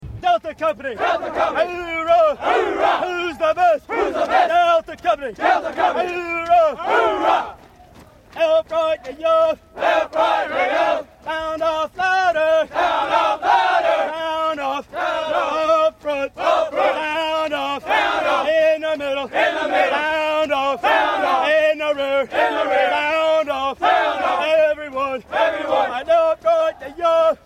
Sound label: Navy Sound Off; rotc; running